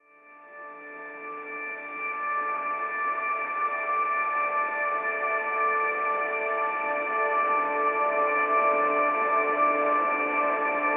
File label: Documentary Soundscapes Light Serious